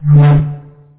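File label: laser swing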